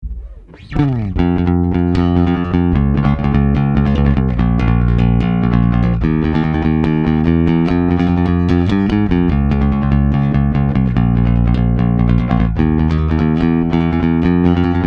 aer amp one p bass pick

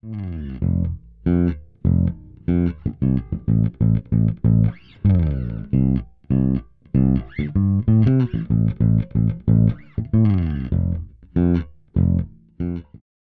Zoom B2 Four Bassdriver Preamp

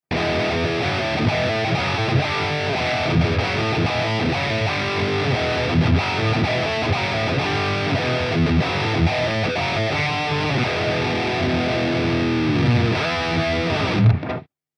Heavy Rhythm; Archetype Abasi

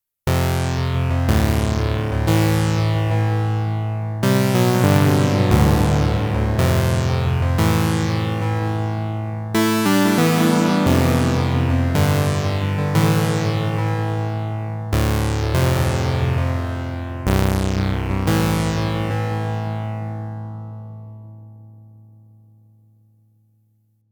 Bass

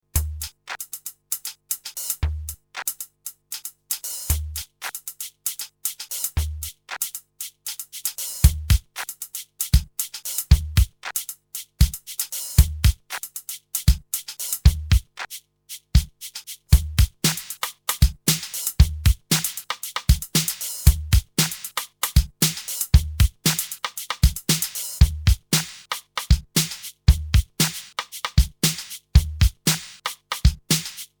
Drumstar 80 Break

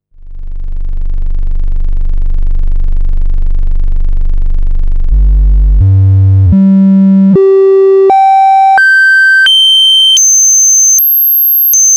Osc Triangle

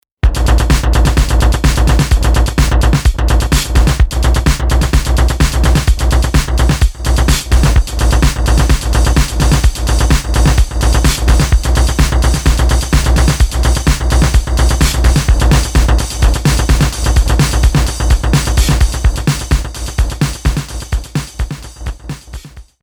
Oberheim DX

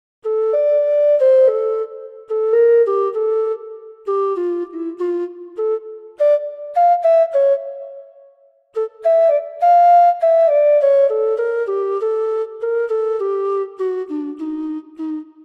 Gemshorn

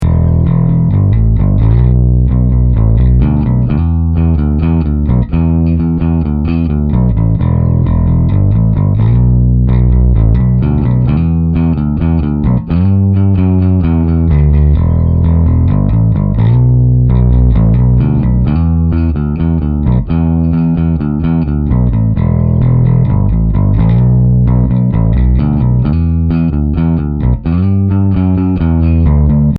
Plectrum Gain Half

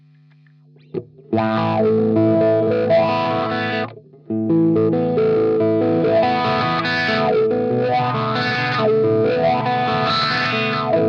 Cry Baby; Classiczum Vergleich

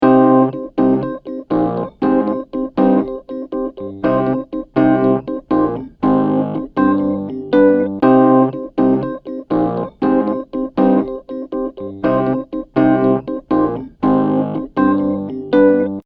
Rhodes Piano; Carrier

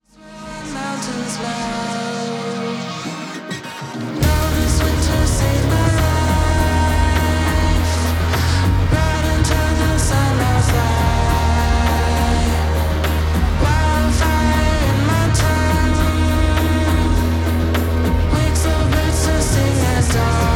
Trip Hop Vocal beat

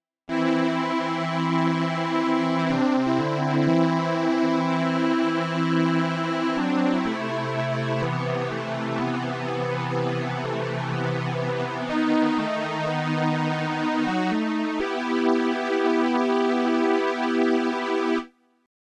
Strings Vplus

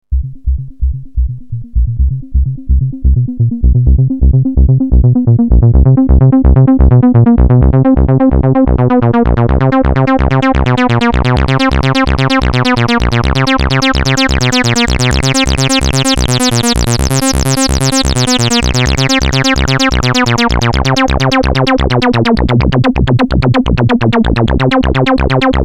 Acid Check

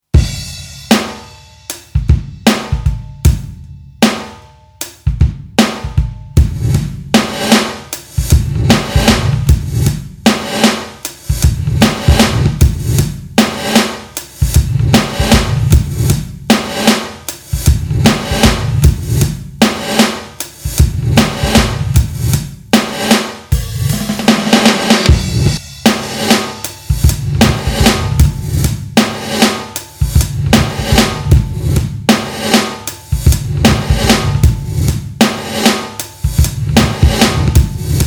Alesis Midiverb2 Reverse 250 450ms

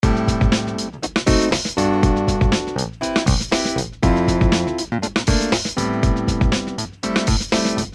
clavinet D6

